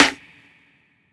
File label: snare; dough-samples; eddyflux